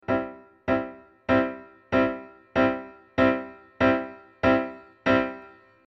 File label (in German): gp 510 aliquot steigerung; Casio; Casio GP-510 Test